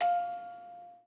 musicbox

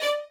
violin

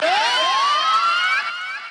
vox

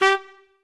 Trumpet melo

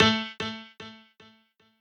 piano sabi

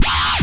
bass

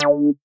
synth

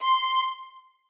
string

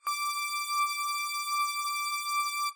lead

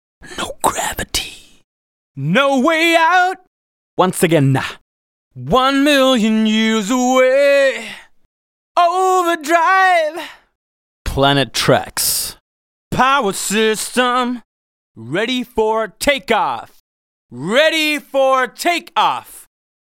Acapella, Techno Trance; Techno Trance Essentials

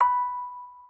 musicbox

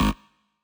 bass

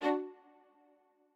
strings

